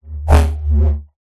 science fiction light sabre swing electronic hum

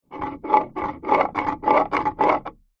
foley wood scrapes blunt object dull weak

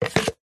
foley plastic cones stack together; zapsplat

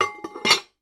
household slow cooker lid on; sound effects one

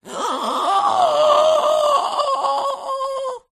choke inhale wheeze strangle high pitched

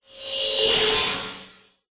science fiction machine swell

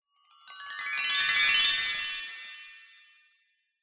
magic wand spell appear twinkle; zapsplat

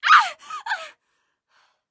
Female shout of pain; Screams Shouts 2; soundbits

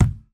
tyre fully inflated kick with boot; transportation; sound effects; sound effects one

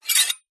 foley metal scrape squeak small

